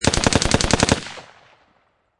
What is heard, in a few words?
Machine Gun Colt AR-15 Long Burst Medium Range; Lynne Music